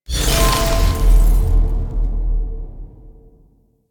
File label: Shard Upgrade Removed; Warframe; Helminth Shards